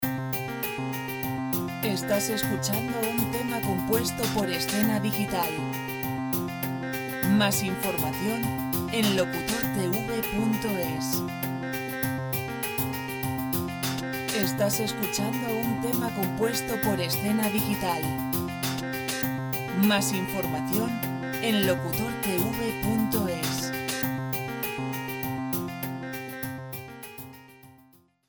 100 informativos; pop

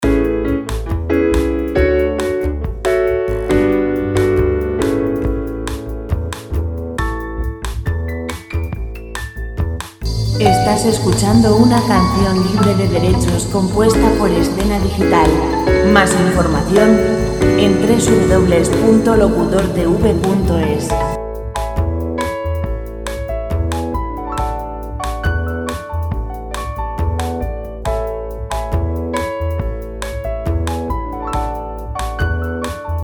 piano lent musica

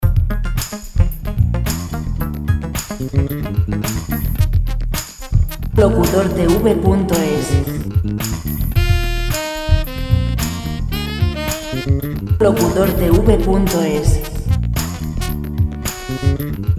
110 jazz musica sin derechos; Royalty free jazz

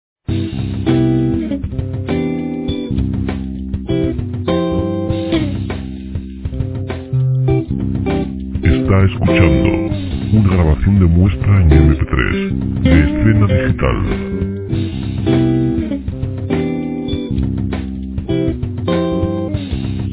acustico guitar lento 100

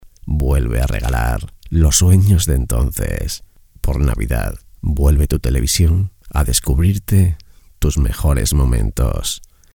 jingle navidad; Indicativo para emisoras, utilización en la campaña de Navidad, número 4

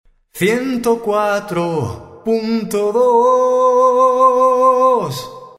1042 jingle dial cantando; dial cantado 104 punto 2

